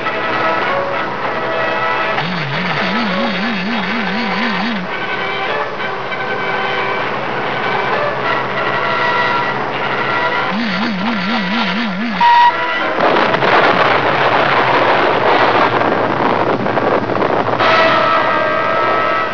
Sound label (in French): bombe; invaders